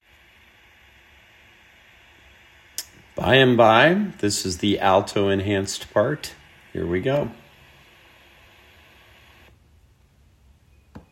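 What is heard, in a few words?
Alto, practice track; RCCC Una Voce & Cantiamo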